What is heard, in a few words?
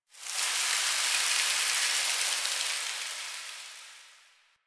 Sand Fall; Warframe; Man In The Wall